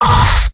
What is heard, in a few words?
pain sharp; scout; source engine; tf; mvm